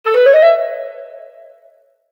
Msg Scherzando; Vertu Constellation T

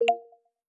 confirmation note, phonetones Nokia N900

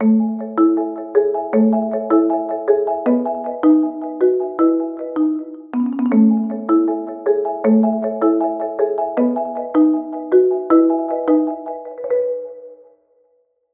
Xylophonic; Samsung; Galaxy A56; ringtones

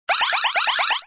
Alert; Hijacked by MTV

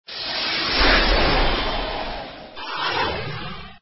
Power Up; LG; CB630

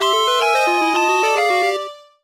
warning short; MediaTek; OP11 Profiles

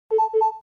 Cockoo; LG VN530 Octane

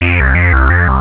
Analog, Cisco Unified IP Phone 7945G

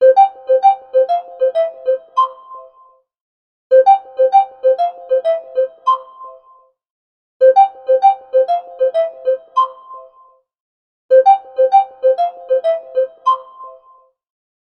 Polka Dots, alarms; K83 5G

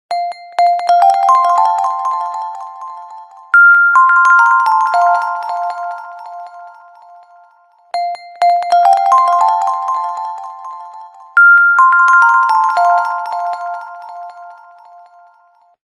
Ring Cascade; LG GW820 eXpo

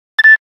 Nokia Buzzer Beep twice; Windows Phone apps

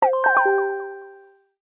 Alert Ding; Sharp FX Plus

